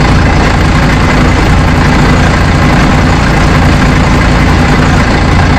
Vehicle Engines; Red Orchestra Ostfront 41-45

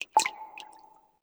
city occasional

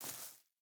footstep burlap grass walk; Rust

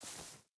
footstep burlap grass walk; Rust

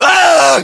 death, voiceovers

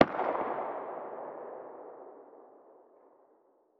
mp5 attack far distant; Rust

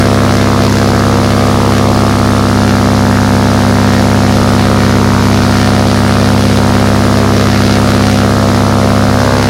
morava side, engines; War Thunder

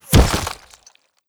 bullet impact cloth flesh; Rust

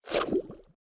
bullet flyby underwater; Rust

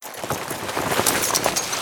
big jump; Call of Duty Modern Warfare

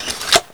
Inf Weapons Foley 3rd; Red Orchestra 41-45

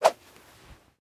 bone knife attack; Rust

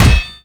arm airpunch

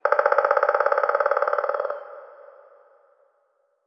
ambient; World of Tanks

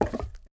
drop wood; Rust

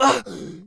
hit; Escape from Tarkov; scav2

